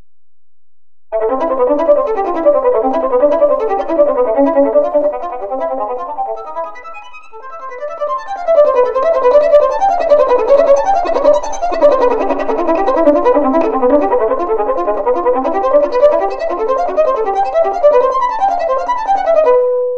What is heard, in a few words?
Sautille varying